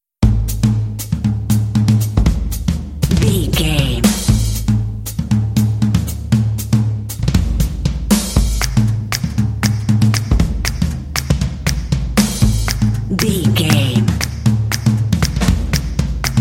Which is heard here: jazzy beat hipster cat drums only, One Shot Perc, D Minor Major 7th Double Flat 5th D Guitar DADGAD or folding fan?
jazzy beat hipster cat drums only